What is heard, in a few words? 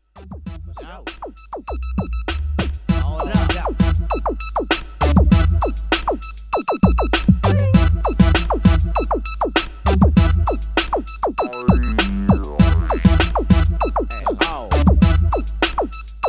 instrumentals